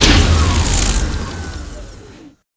killing Sound Stop

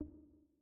City SFX, OUTRO Blink

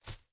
Footsteps Leaf; Main Character

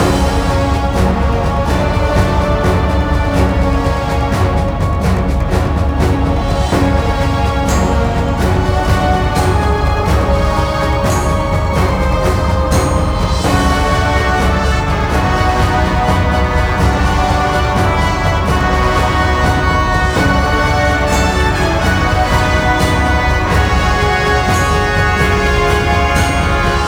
Cutscene Victorious Loop

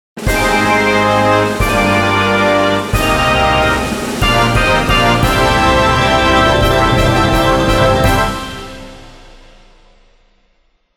BGM Result Win

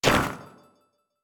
Break

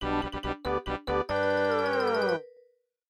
MIDI Animation stings

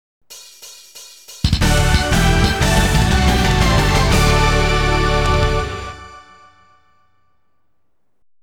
Gameover; Cyber Troopers Virtual-On Force; Xbox 360

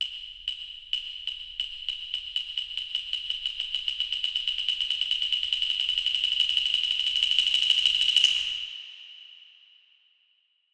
Tension Blocks; Age of Conan Rise of the Godslayer

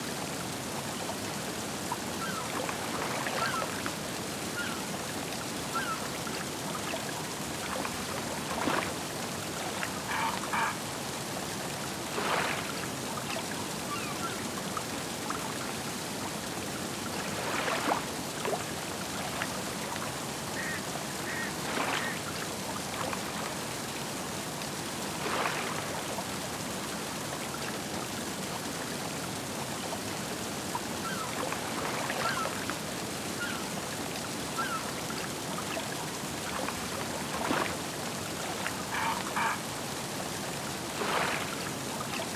Lake Raining Low Birds and Low Water

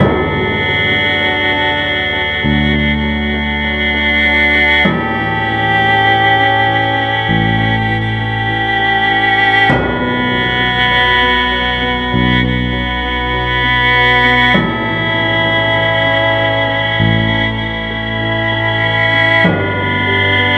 Tension Loop 99bpm

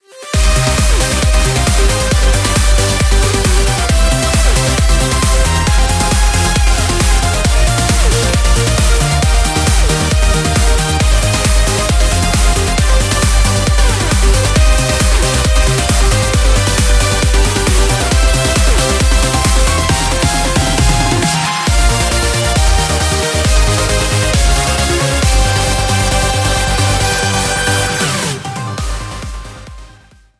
BGM xfade; Invaxion

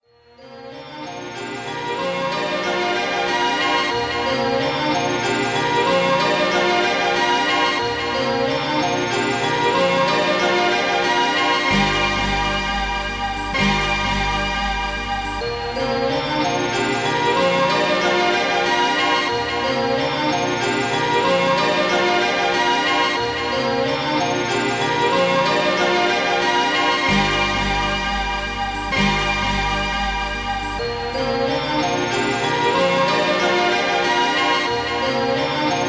waterfall; data wing; android ios

